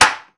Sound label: Pinball Wood Knock Free Game